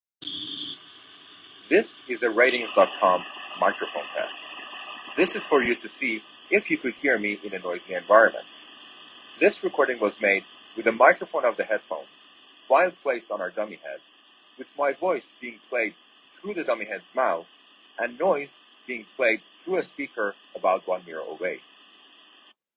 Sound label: iphone pink noise